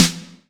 snare mod

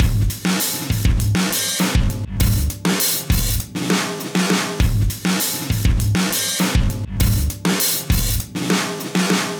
100 live funk drum

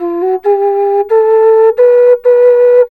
SERULING, Heart of Asia

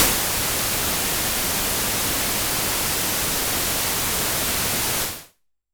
Waldorf Pulse Raw, white noise; noise hiss crackle

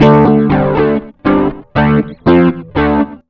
rock, acoustic guitar loops

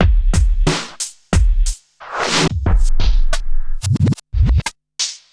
Street Tip, R&B Drums

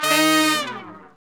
BRS LNG FA, Section FX R&R Falls; Roland L-CDX-03

